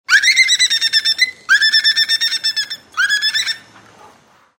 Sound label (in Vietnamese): am thanh cua mot con ca heo het len